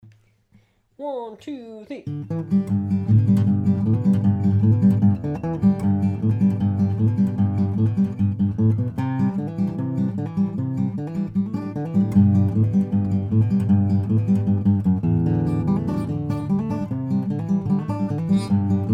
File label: Hoedown